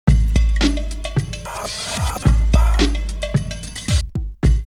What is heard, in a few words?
Dope Jam 110, drumloops OldSchool; X-Static Goldmine